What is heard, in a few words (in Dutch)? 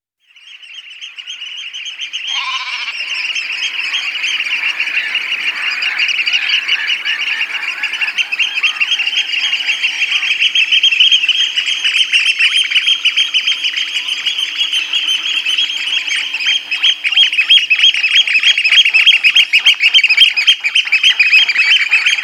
scholekster